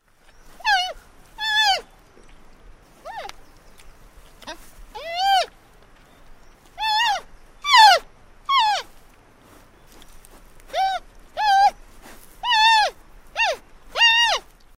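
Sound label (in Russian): malysh panda, baby panda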